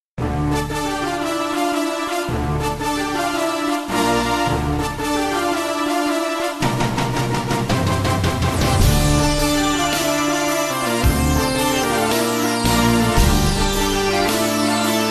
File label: landscapes